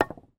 sport pool snooker cue strike ball slight bounce on edge of table